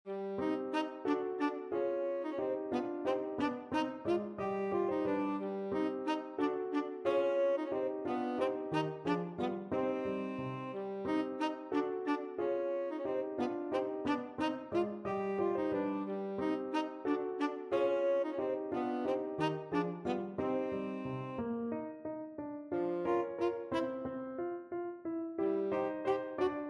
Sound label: tenor saxophone